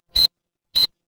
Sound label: ui beep